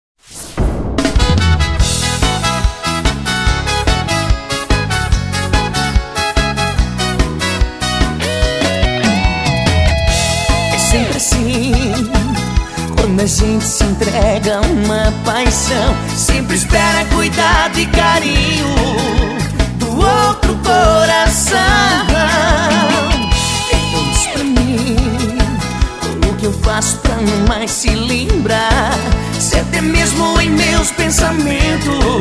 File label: drums